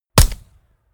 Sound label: gun shot silencer designed realistic; schusswaffen